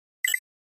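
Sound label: Flash Beep Electronic Tap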